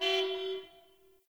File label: Distant Car Horn; Warframe; Ambience; Nineteen Ninety Nine